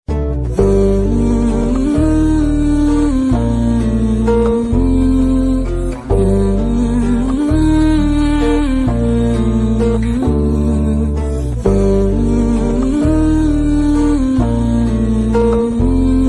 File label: sad bgm ringtone; ninnu kori